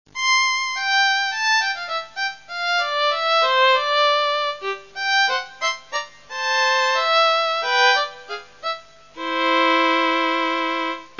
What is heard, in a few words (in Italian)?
violino; primavera